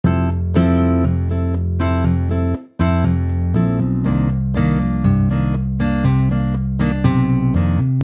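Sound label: bossa nova guitar rhythm with percussion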